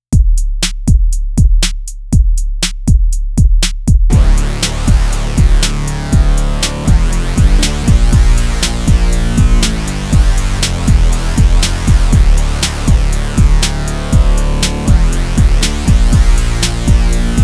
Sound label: Arturia Minibrute